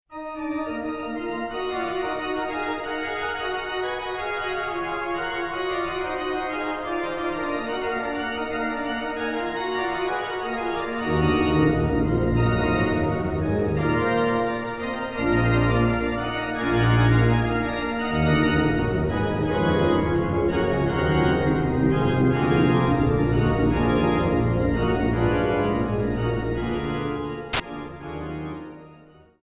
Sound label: organ toccatas doorinen toccata; j s bach organ toccatas